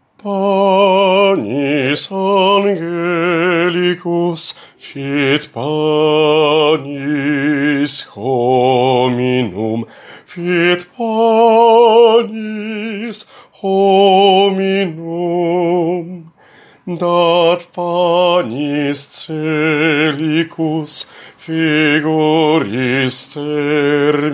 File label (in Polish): BAS; Panis angelicus